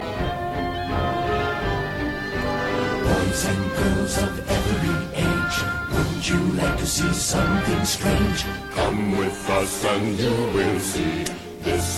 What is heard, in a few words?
halloween tone